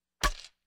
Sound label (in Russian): slyshno setku raketki; Звуки игры в бадминтон